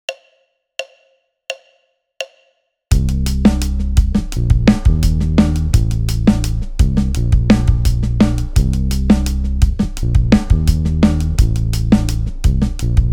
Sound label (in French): basse